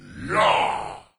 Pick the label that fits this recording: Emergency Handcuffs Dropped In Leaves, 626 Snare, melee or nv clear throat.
melee